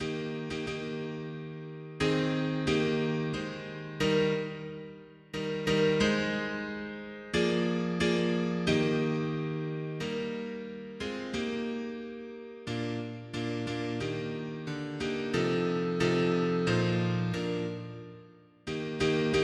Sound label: mono piano; hymns; Himnario grande